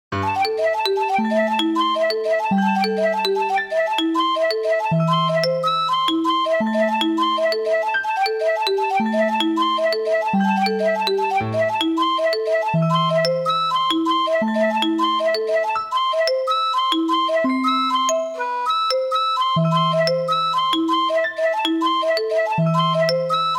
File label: shakuhachi dancy; fractal music